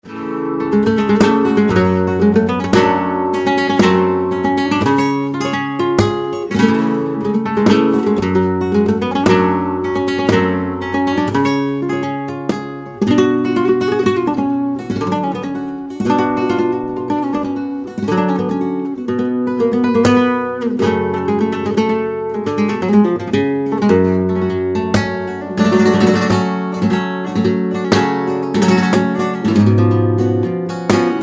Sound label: Flamenco Guitar